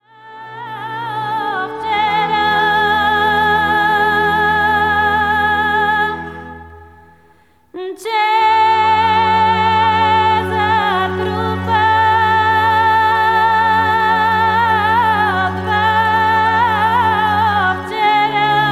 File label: female choir, bulgares